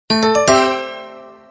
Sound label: notification